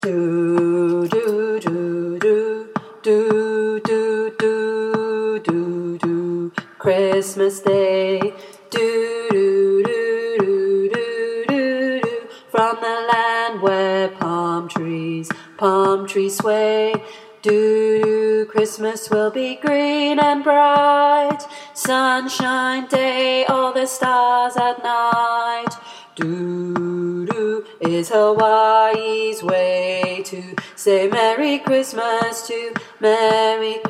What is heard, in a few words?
tenor; brightonswingchoir